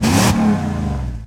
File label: Truck Dakota Rev; Trucks